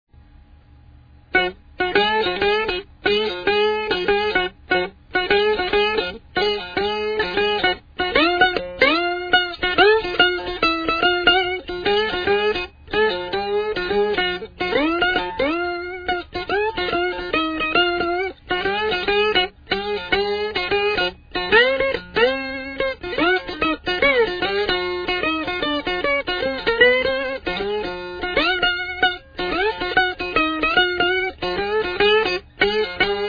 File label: Ukulele (2 string); D.F. Duck